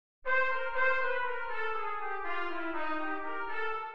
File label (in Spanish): Min7 en C